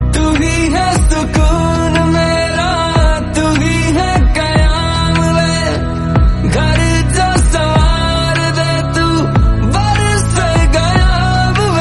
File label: Hindi song